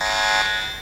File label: alert; area51; Alachua County EMWIN System; skywarn